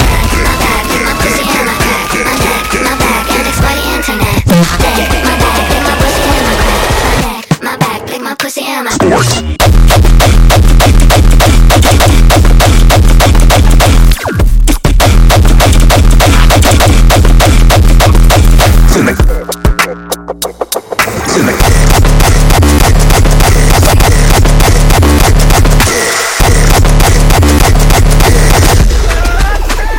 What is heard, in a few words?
fast sports